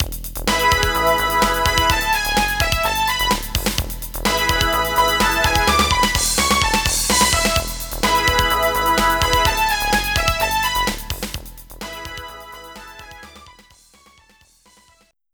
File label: Continue; super sidekicks 2 the world championship; neo geo cd neo geo